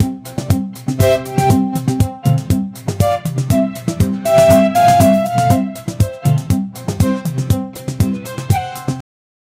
smooth bachata guitar, latin

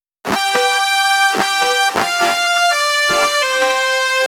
happy sound

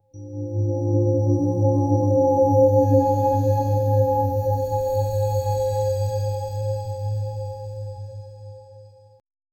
ethereal divine aura

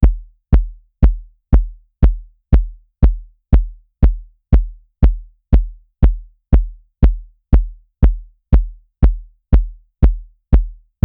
generate a single drum hi, build up

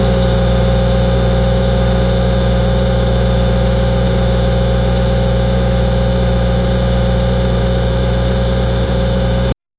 eletric car idle stopped; electric car idle stopped

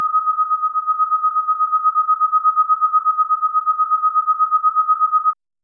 beeper pager sound; SFX Engine

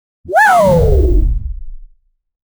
cartoon jump sound soft; platformer

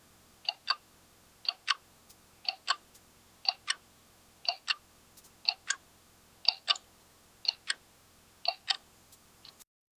soft clock ticking

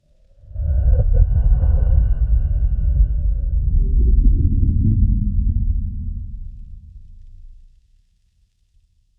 intense cinematic lava, lava

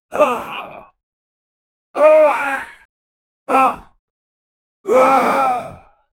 male grunts of pain

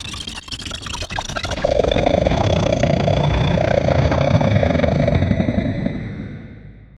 alien or monster

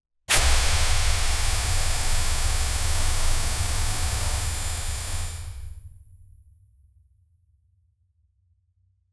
sci fi sound effec, laser; make a sci fi sound effec

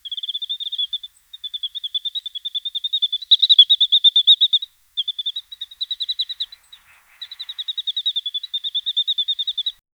hydra soundsbear and croc, squeals

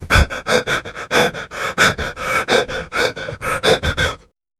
close up sound of a mans, anxious

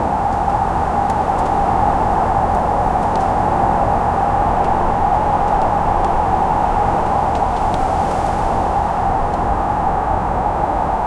soft winter wind ambience

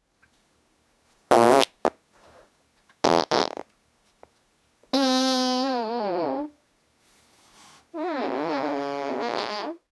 woman farting, bathroom

